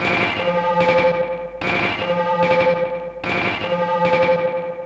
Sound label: Crude Tape Loop, Tape delay; radiosonics